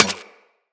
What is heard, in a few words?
Laser Impact Metal